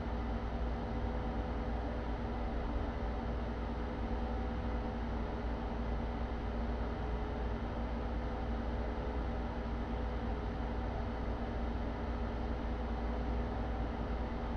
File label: tECH room cue mono